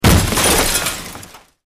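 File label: Explosion Concussion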